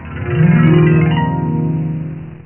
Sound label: harp